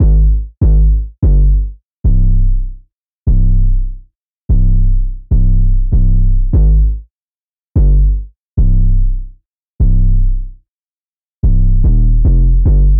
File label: 808, 147 BPM, Cm; Unison Free 808 Samples